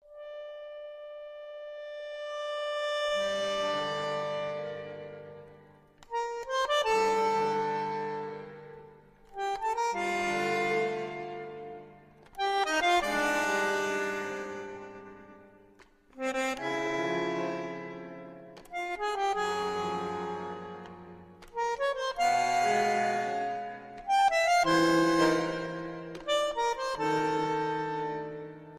Jouer Une Chanson, accordion Trio + String Quartet